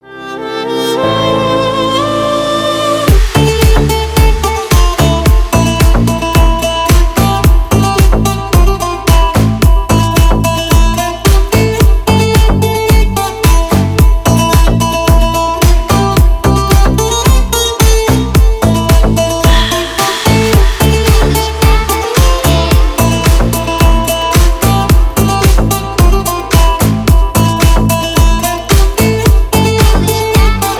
arabic night techno project

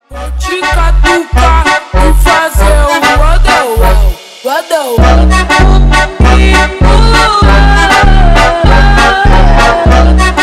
funk do bounce super slowed

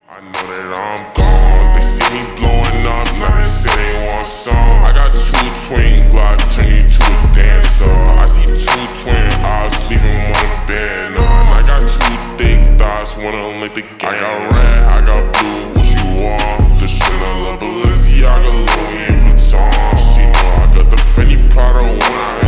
slowed reverb

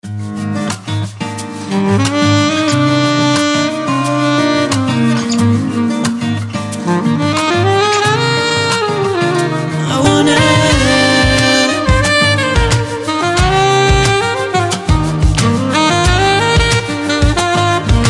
delight acoustic

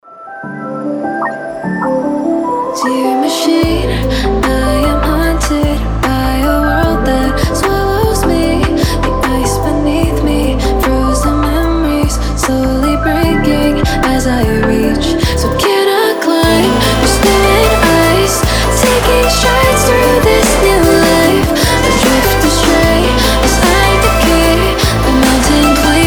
beyond the peak chime

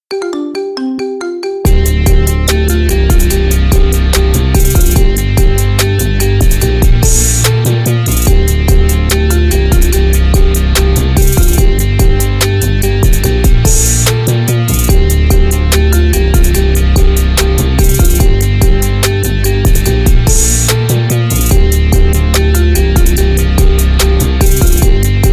marimba remix

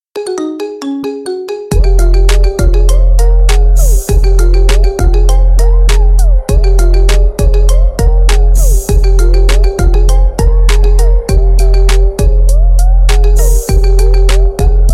marimba remix

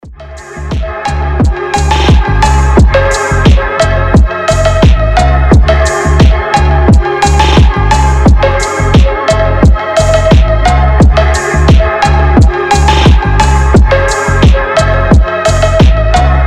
chill

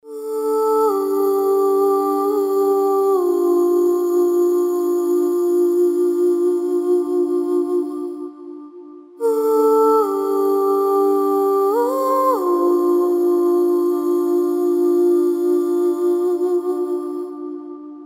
main theme acapella cover; call of duty modern warfare 2

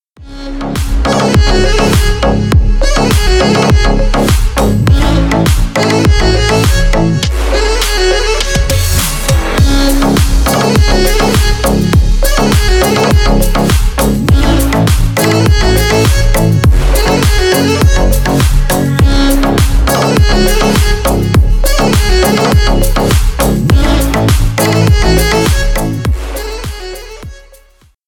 viola

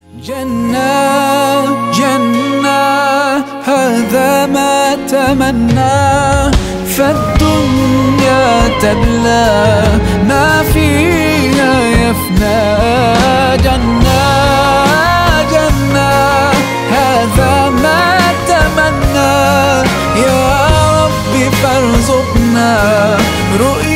arabic version

